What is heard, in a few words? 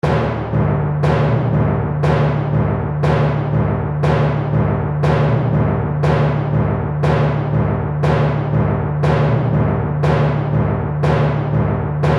Epic War Drums Seamless Loop; Battle Sound Effects